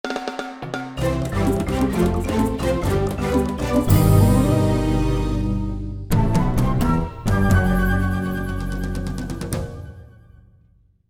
Music Jingle Ending Bad; new joe mac caveman ninja

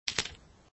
Chick Step

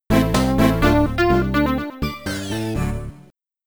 Antarctica Bonus - Jingle; ultimate pinball quest the amiga

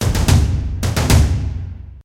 Battle Begins; Rezrog

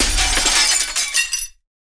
glass break

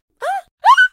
fall; Talking Tom Gold Run

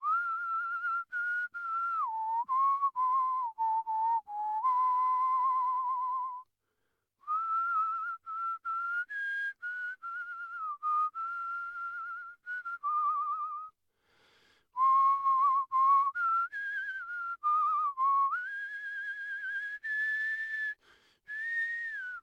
Whistle

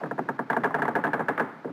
Fountain Close; Levels Hub Restaurant; Fountain Close Creak

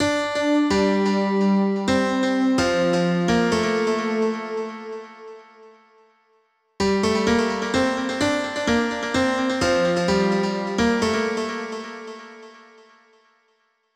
Melody

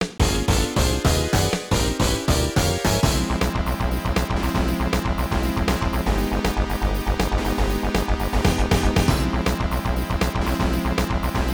High Energy, Boss Battle; Gunbird 2

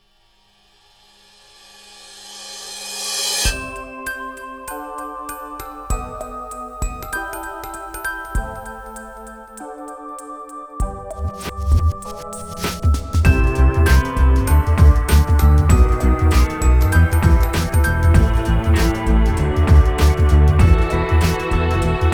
Karaoke; Memories Off Complete Box

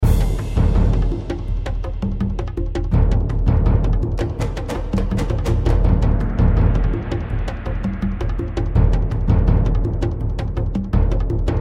combat music stage 2; Might and Mayhem

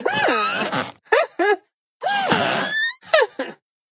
Tea party Movie Scarecrow

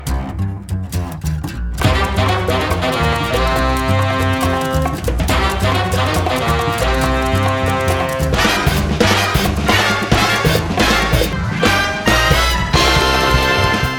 Jingle Level start; neighbours from hell smg4 mod hardcore reload

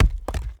masonry hit

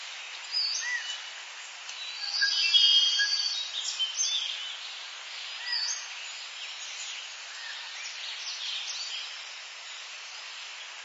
Outdoors

